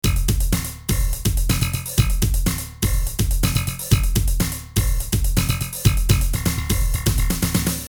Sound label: depressing rock music